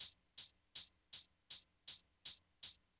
080 Pop Tamb; Pop Rock; Percussion

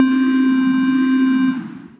Synth Keys; Uni; Ableton; Glitch and Wash

